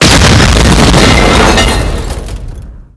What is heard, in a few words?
explo metal med; weapons; Spearhead Demo